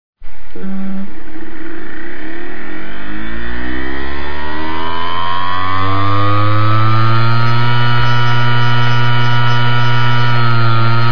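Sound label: wd 500gb bad bearings, Hard Disk danneggiato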